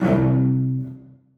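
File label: celli staccato c2; Sonatina Symphonic Orchestra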